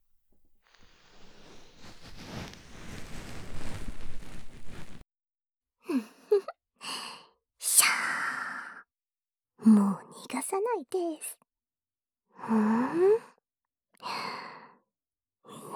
右耳かきパート, ASMR

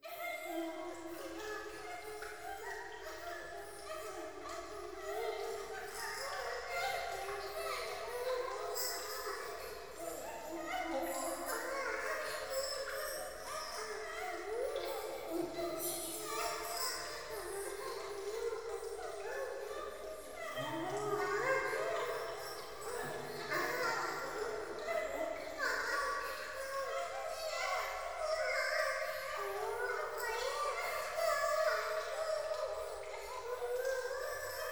風呂 喘ぎ

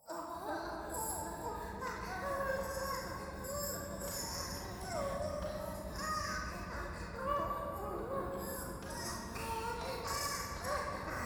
低音喘ぎ 電車

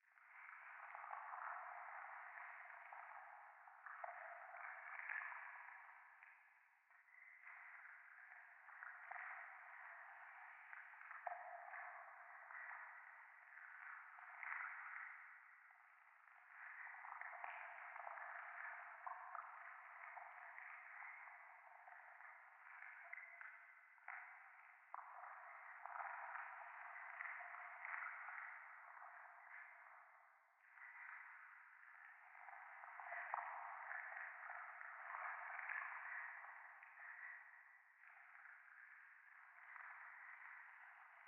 廃墟 左耳密着吐息 触手

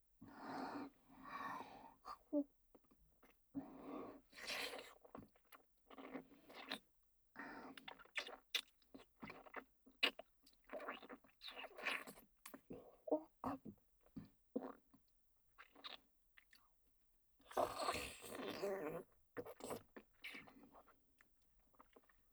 雨音; EX03. 雨音パイズリフェラ

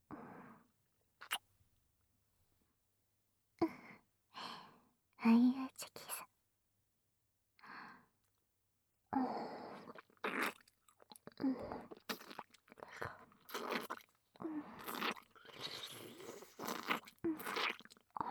耳舐めパンツコキ, ASMR

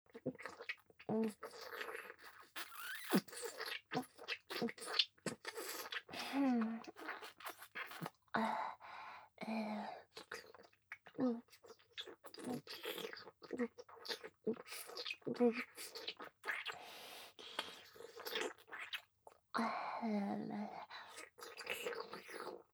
フェラ, ASMR; loop音声

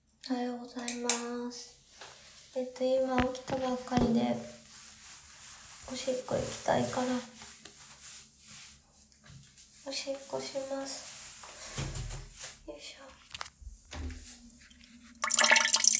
寝起きな！オシッコですッ！

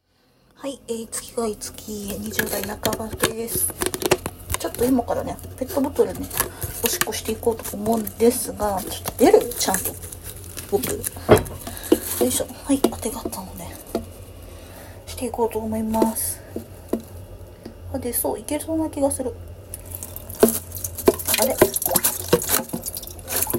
トイレでペットボトルにおしっこ

